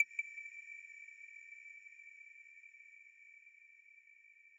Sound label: arp